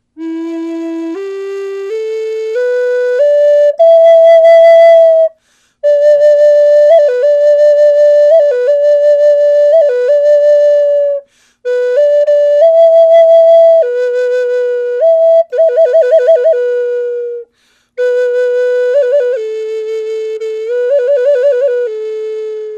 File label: Cedar Classic